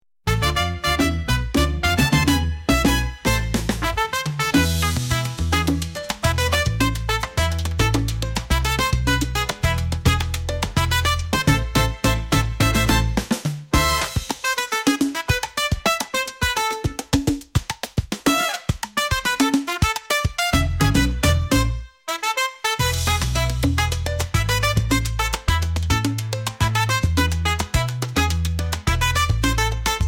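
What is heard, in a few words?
rhythms of the desert, African; afrikanskaya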